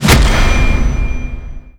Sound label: charge impact; spirit breaker